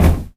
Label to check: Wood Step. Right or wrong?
Right